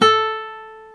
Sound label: GTR Nylon, Nylon Martin